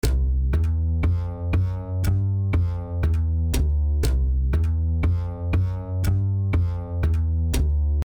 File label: Walking Bass